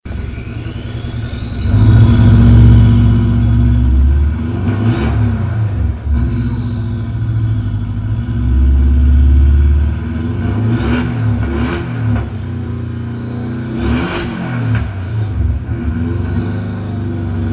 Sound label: Porsche 718 Boxster; Porsche Soundnacht 2016